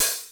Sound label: cymbal, Roland MC-909